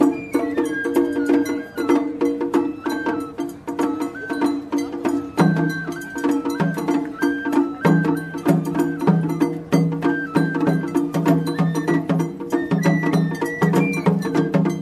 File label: ohayasi; town